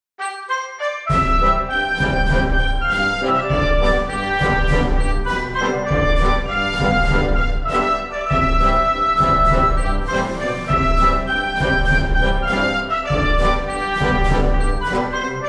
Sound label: Diplomaticsuccess